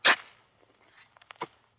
CAVAR HAZADA; yesterday origins gamerip 2016 switch ps4 pc ios macos

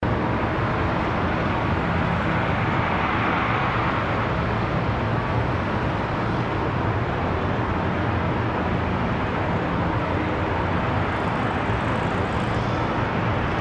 Ruido fondo día

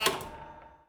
silla parada

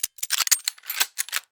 ABRIR SECADOR; Yesterday Origins

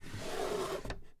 ABRIR CAJON; Yesterday Origins

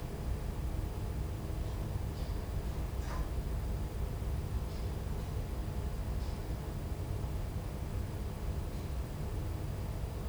Ambiente cerrado; Yesterday Origins

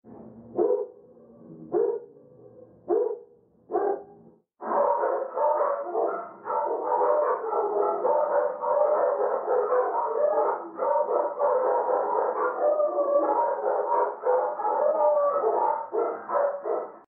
fondo perros

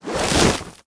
CUERPO CAER

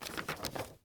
MOV PAPELES; yesterday origins gamerip 2016 switch ps4 pc ios macos

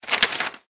Periódico abierto

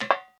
Zapato tacon escalera metal; Yesterday Origins

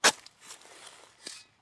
CAVAR HAZADA

